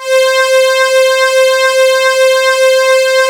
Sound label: POLY MINIMOOG; Rubber Chicken Software Sample Bank